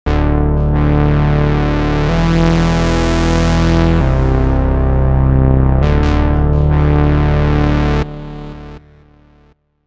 motionbass

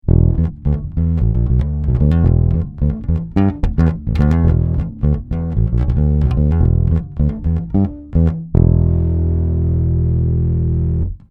low

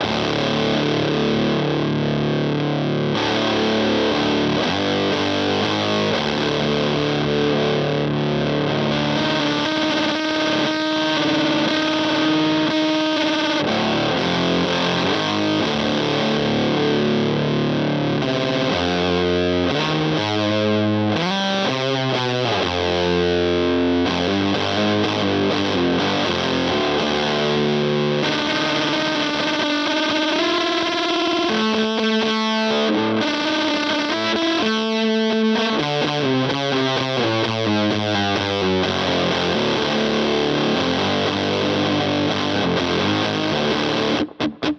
JHS Cheese Ball Mode 2

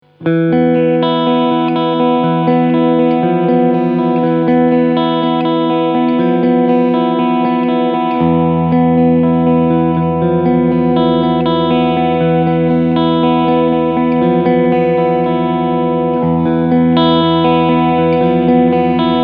Clean Pos.

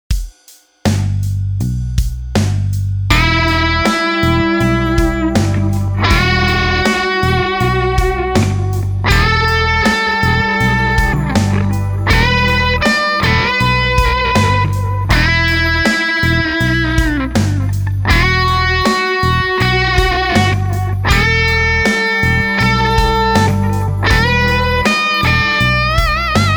Lick

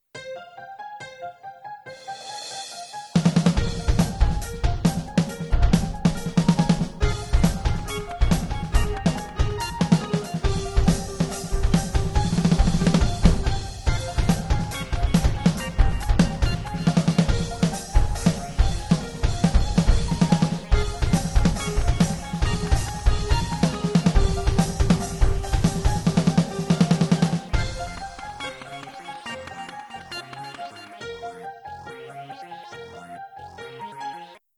Drumit loopkit

